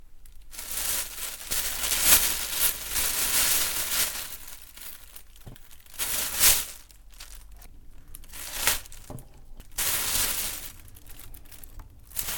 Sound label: vinyl bag